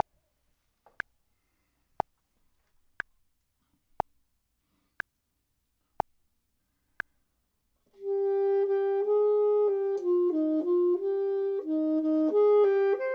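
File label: top, Saxophone and Clarinet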